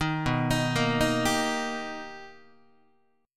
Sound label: B Augmented Major 7th B down Guitar; B+M7; arpeggiated; 6,7,6,8,7,6; 4A,4E,5A,5D,5G#,6C#; JGuitar